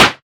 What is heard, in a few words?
garbage in medium; quatuor